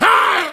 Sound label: hunter pain short; player; voice; source engine; left 4 dead 2